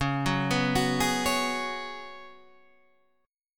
Db 7th Suspended 4th Db down Guitar Half Step Down